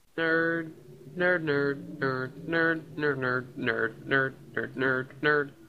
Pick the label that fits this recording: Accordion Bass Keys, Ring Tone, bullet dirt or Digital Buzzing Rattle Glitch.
Ring Tone